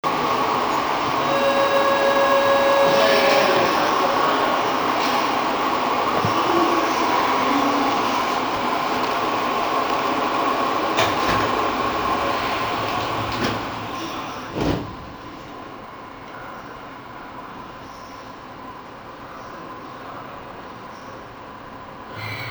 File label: tokyu 8799, train motor sound